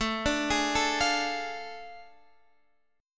A Major 7th Suspended 4th Sharp 5th, Guitar, Standard; AM7sus4#5; arpeggiated; JGuitar